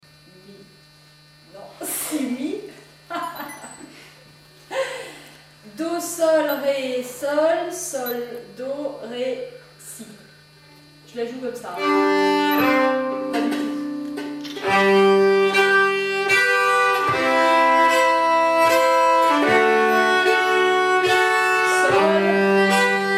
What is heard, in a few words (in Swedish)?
Vals Acc, nyckelharpa